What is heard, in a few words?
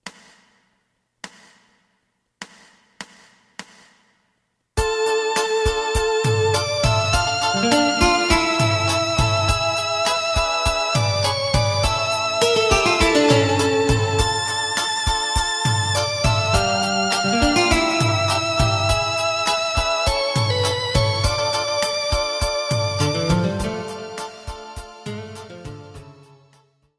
bass